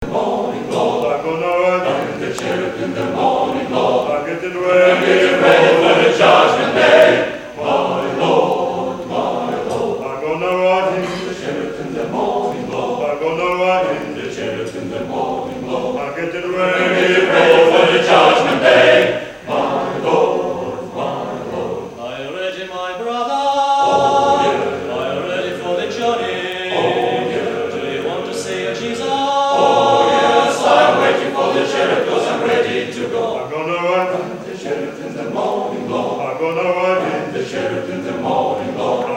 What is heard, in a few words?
male choir, live; ride the chariot; male choir of mephi